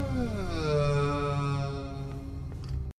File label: Sighing Door